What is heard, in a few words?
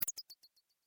Beep Electronic Ping Multi Step Pitch Varience; User interface feedback sounds; Beeps; Multimedia